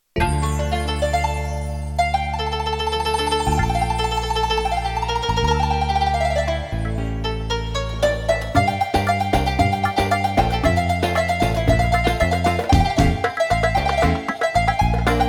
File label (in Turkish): Ketron XD9 ritim